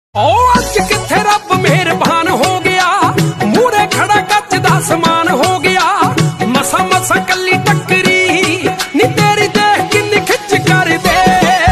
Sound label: Punjabi Beats Ringtone